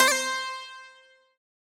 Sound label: Santoor C4